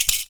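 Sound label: PERC, percussions; Univers Sons - Raricussions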